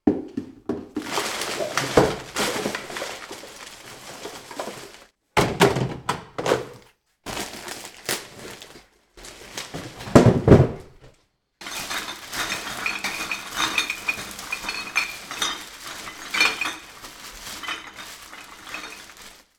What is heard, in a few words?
Garbage Sounds